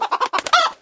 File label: chicken